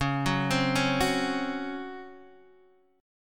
C sharp Major 7th Suspended 4th, Guitar, Half Step Down